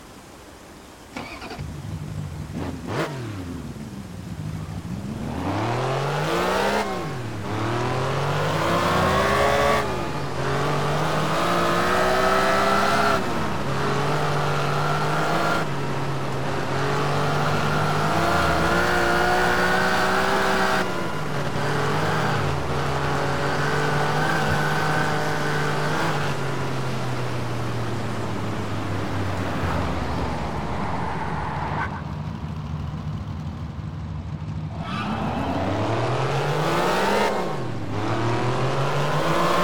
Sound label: alfa romeo gt 3.2 v6 24v technical kit; TDU-1